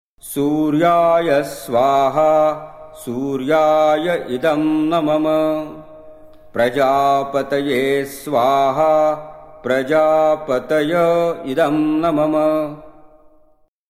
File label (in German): agnihotra mantra sonnenaufgang